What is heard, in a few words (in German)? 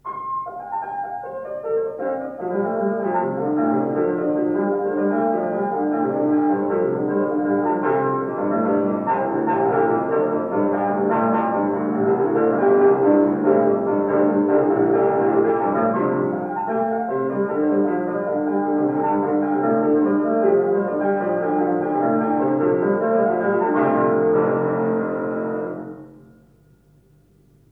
klavierstucke tokkata